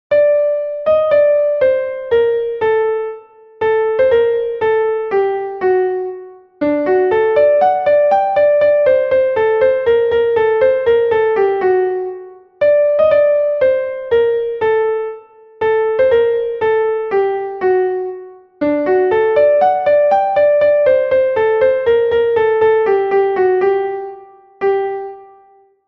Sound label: Bagatelle in G Minor, piano